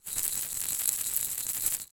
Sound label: Household Maraca Shake